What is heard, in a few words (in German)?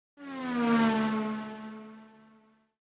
Auto vorbei